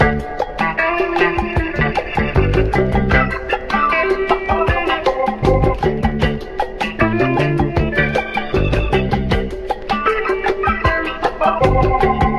guitar regga, loops; Phonky

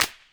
Clap n Snap, Snares

